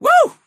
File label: woo